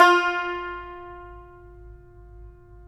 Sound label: tar; Roland - LA Composer vol. 3